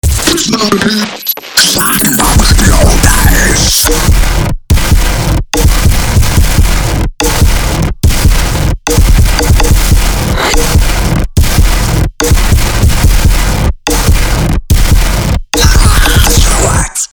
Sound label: bubb bass